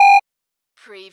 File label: Servo Panel Sound - Beep long